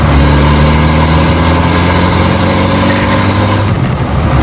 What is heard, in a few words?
second, vehicles